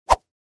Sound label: Weapon Staff Whip Thin Fast